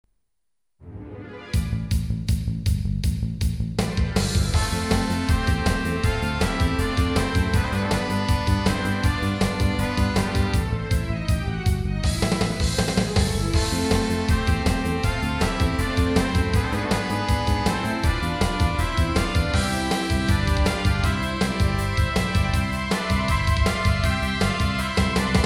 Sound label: battle